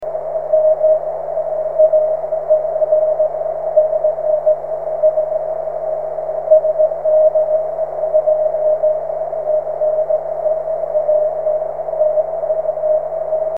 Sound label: 13cm cw; sm2cew